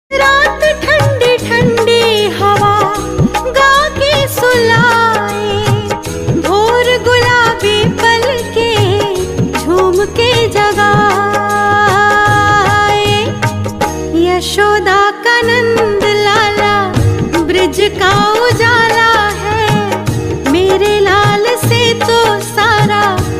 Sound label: cute bhajan call ringtone